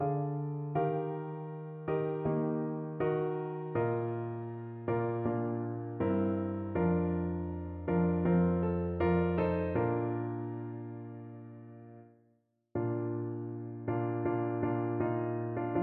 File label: myfanwy, Tuba